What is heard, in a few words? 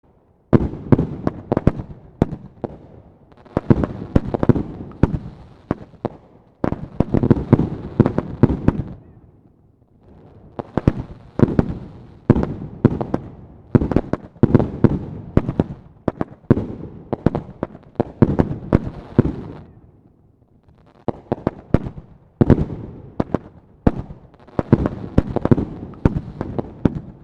Distant fireworks explosion low rumbles city at night